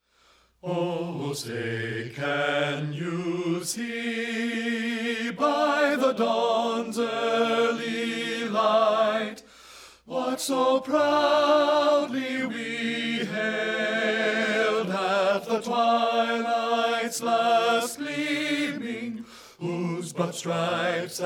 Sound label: BASS; men